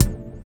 VIBRA; Spectrasonics; Metamorphosis

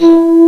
calliope; jazz jackrabbit 2; M8

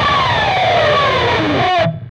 PRS SCRAP, Guitars; PRS SCRAPES

